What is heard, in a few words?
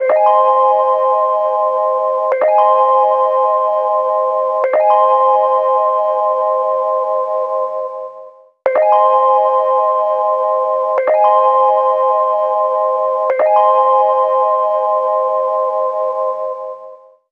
ringtone shine; The Light Phone II